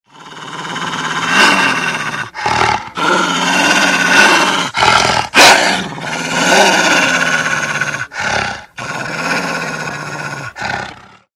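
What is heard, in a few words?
sound of wolf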